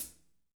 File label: foot